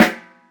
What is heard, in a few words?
snare drum sound a sharp key, steel snare drum sample tuned to the A# note